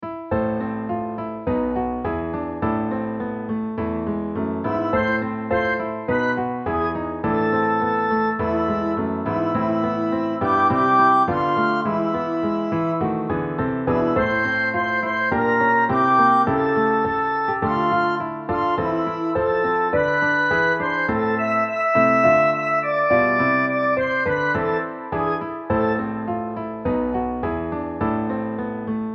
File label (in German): Herbstlied SOP